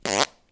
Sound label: Fart; lego ninjago rise of the snakes